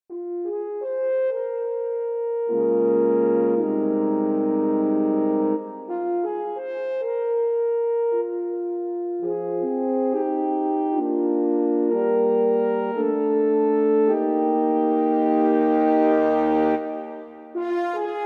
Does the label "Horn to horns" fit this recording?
Yes